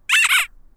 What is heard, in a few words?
mob ko; ShootahGame3D